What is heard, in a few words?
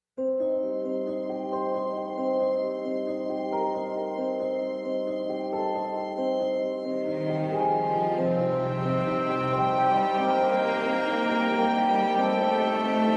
Drama Fantasy